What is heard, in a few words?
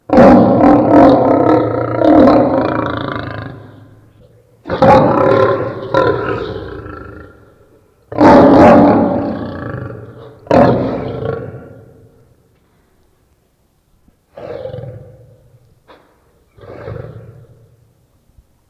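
lion hoeru, ライオン 吠える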